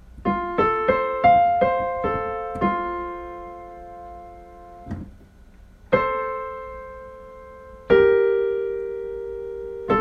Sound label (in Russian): интервалы